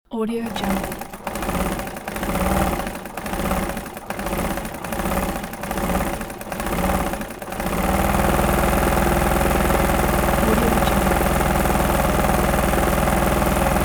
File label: sewing machine working